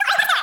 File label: Bonus Game, SNES; Corn Buster